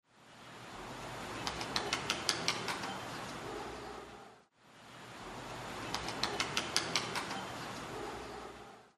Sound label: Rock Dove Columba livia